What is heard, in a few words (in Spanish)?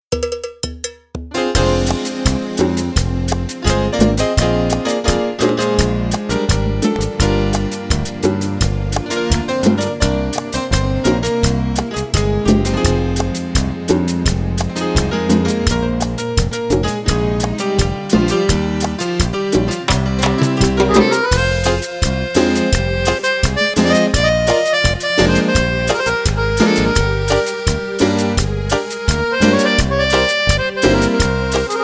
versión cumbia